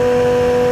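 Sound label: engine exhale, advanced engine sounds; engine2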